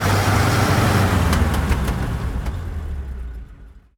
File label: engines; World of Tanks